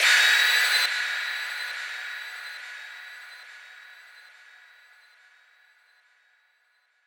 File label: Surge, Beeps; SFX